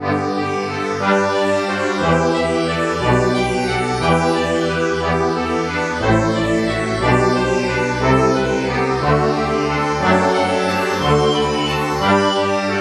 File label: accordian bach